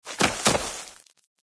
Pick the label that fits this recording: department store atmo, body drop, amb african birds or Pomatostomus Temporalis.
body drop